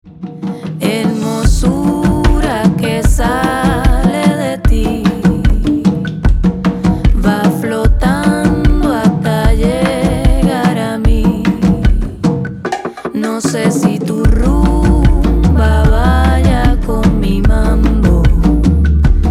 Tu Rumba

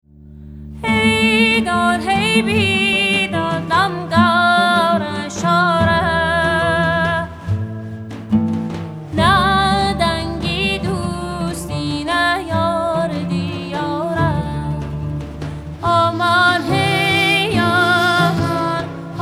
Kurdish Song

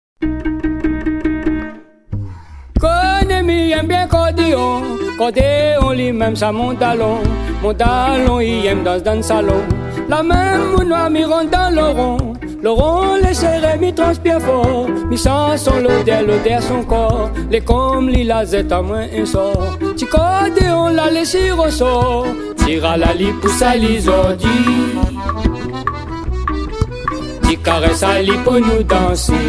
ti cordeon

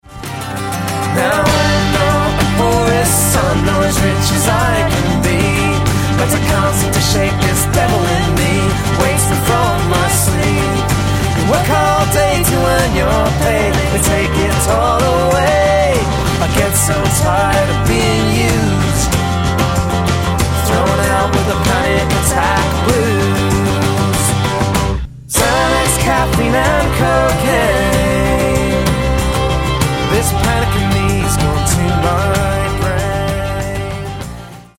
Blues

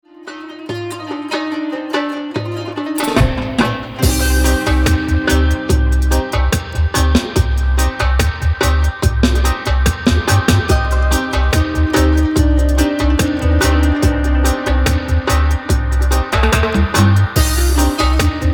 Qanun in Dub

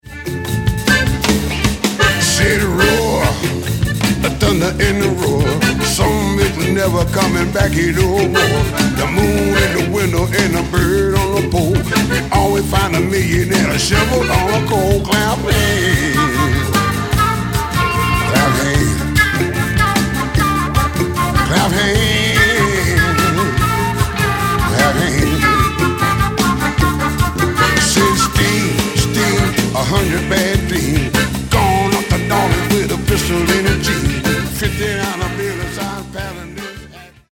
Clap Hands

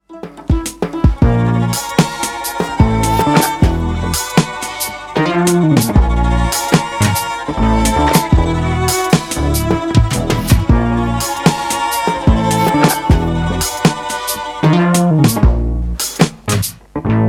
Glendale Soul Train

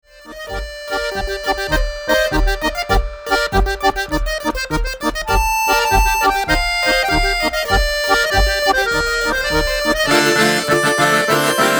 Accordion

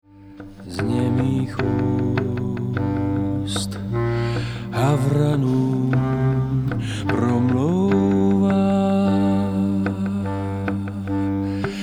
Clarinet

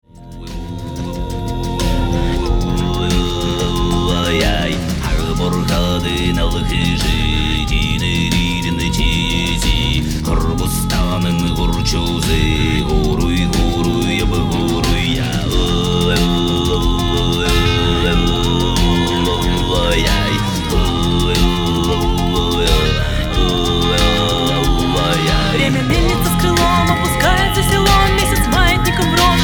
Scythian Trance

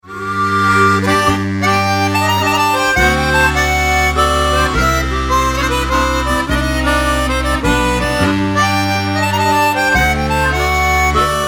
polska

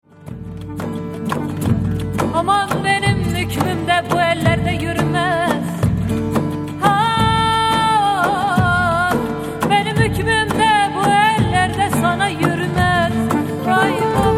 Rumba Türkmen

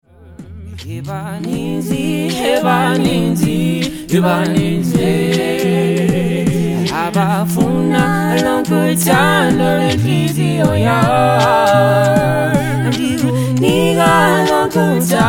Beatbox Version

